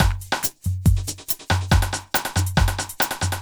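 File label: industrial 140 kit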